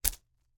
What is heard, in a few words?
slingshot, dry firing, slap, rubber, rustle, shot; WristRocketPro; BlackMamba bands; Eiravaein Works; Vaeyan IV